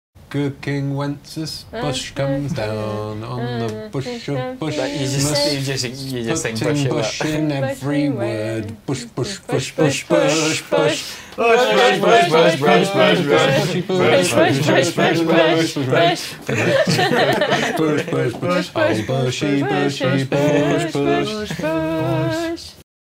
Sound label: Christmas Bush Song